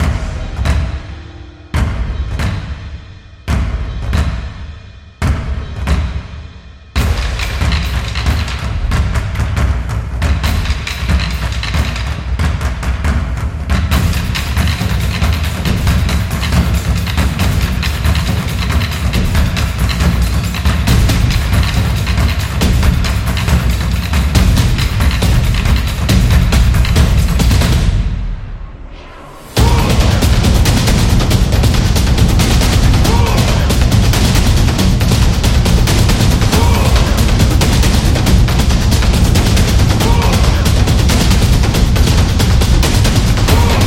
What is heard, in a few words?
Japanese Drums Taiko Percussion; tribal dance